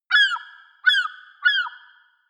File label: seagull call squawk